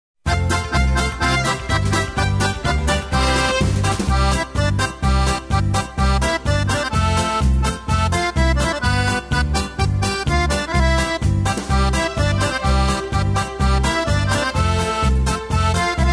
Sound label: jingle bells, square dance music